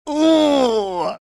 pained sound

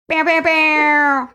making air horn sounds in clear

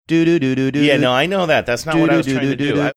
singing portion of; super mario bros

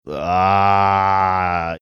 drawn out ahhh

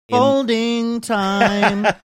singing

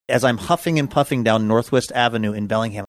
huffing and puffing; down north west avenue

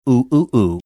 ooh ooh ooh

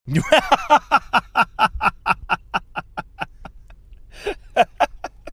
awesome laugh

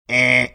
making buzzer sound

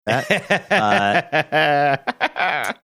funny laugh

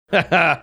chuckle

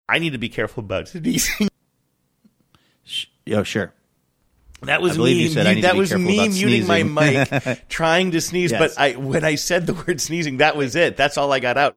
anticipating a sneeze muting mic

